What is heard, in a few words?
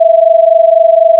beats; phasors